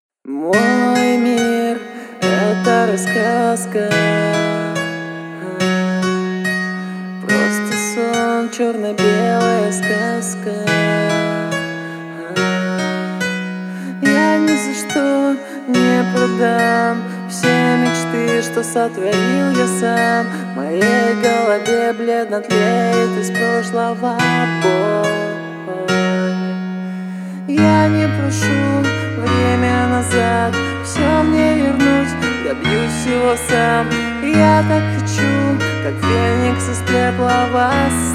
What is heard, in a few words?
acoustic